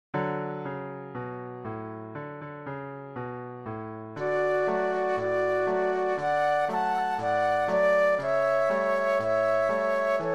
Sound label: flute duet and piano